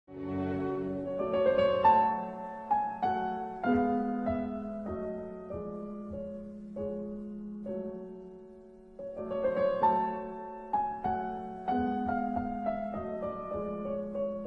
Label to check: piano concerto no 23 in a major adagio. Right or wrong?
Right